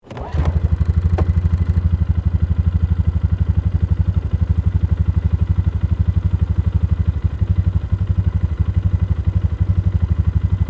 ATV Idle, Sports, Atv, Start, Up, Idle, Off, Front, Perspective, Polaris, 500Cc, Four, Wheeler; Blast Wave